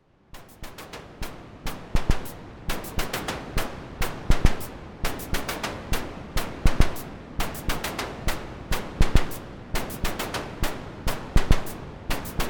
Model 25A

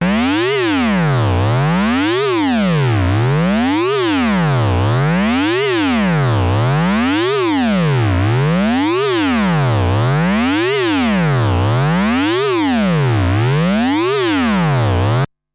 Korg MS20 Ringmodulation LFO

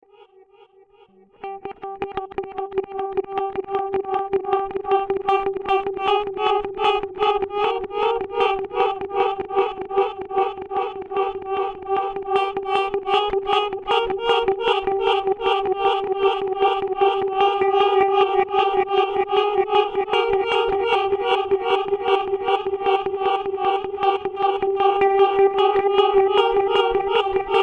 Boss DD 200 Reverse

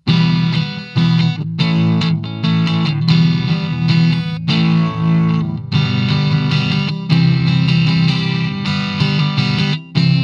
Telefunken M82 E Gtr Kick off Boost off

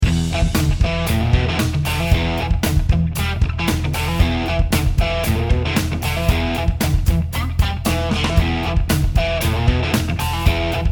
Funk Combo Style

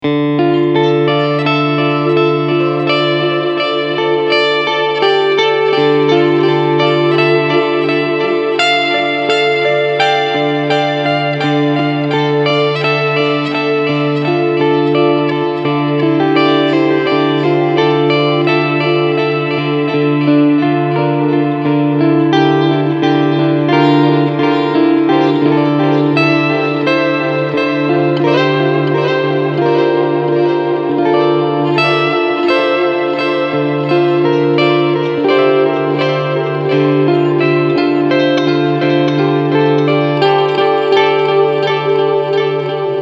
Reverb Delay Mix

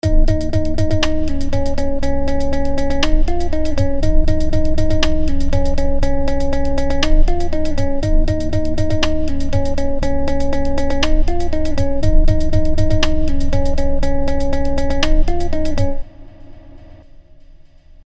TimeShaper 2 Rhythmer

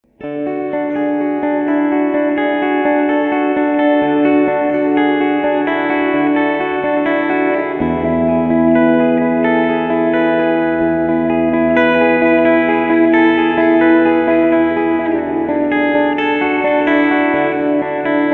dreamy

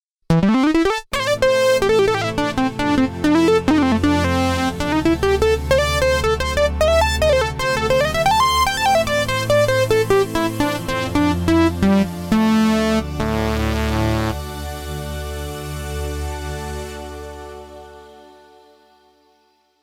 Behringer Kobol

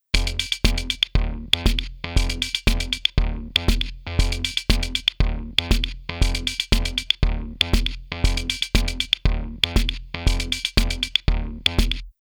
Blend Amp driven comp; Rupert Neve Designs Portico 5017 RW

